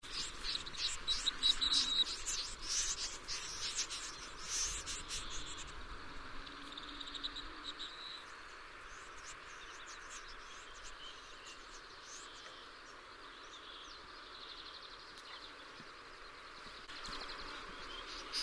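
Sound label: Gallinago stenura; Kahlaajat kurpat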